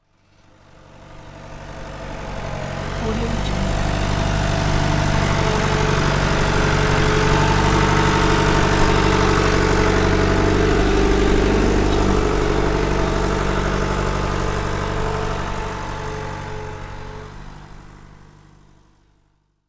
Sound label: slow digger passby; fxprosound